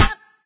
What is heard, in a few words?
hero stumble; subway